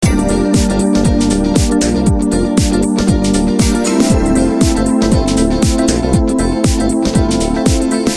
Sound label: love loop